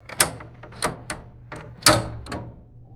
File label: heavy metal door lock operating; doors